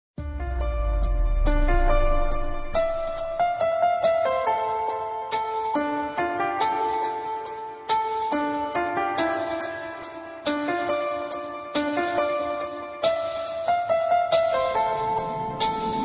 dubstep remix; legend of zelda

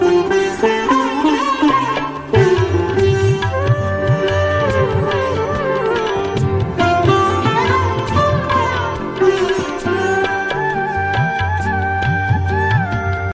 Sitar Tabla

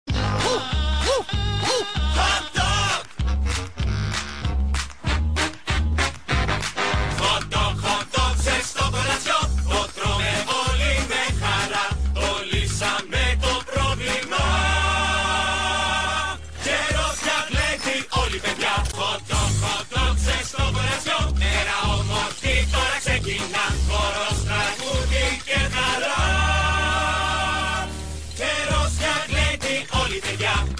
song in greek; mickey mouse clubhouse

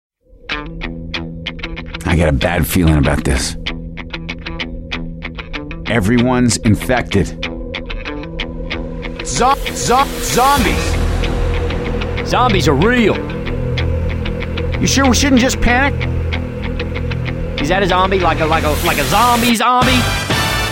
zombie, rock

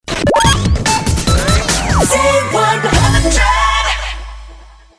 Shot

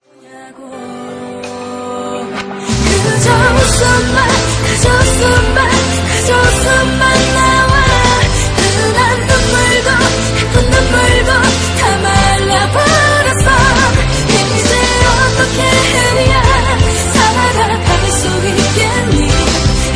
laughter

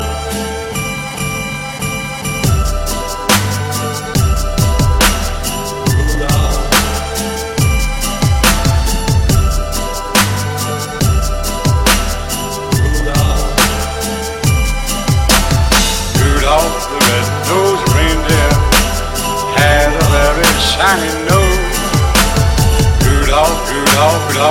hip hop; holiday

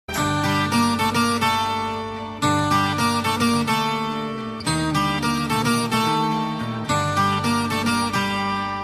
cool new tone, electronic ringtones

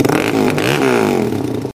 Suzuki DRZ400, ringtones

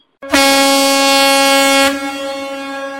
bus hornnn; ringtones; sms

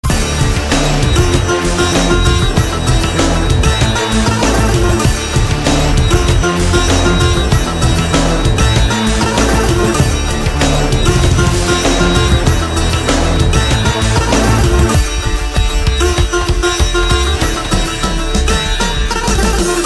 slumdog sitar

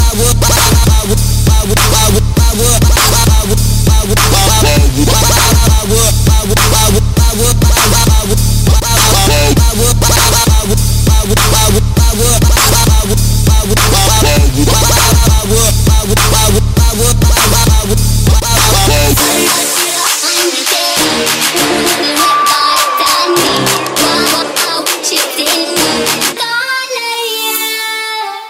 Crunk

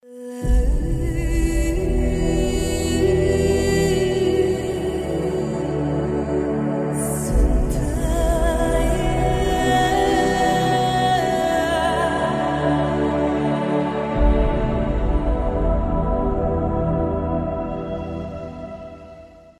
Angelic Harmony

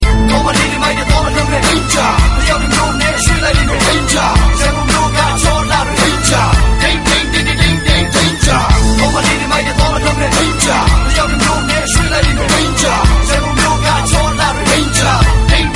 danger snare; hip hop

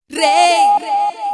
reyyyyyyyyyyyy

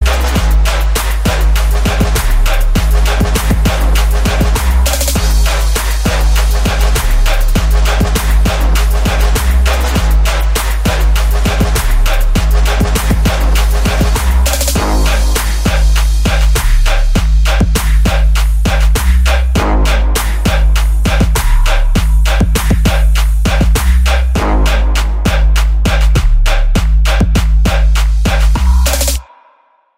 Beat

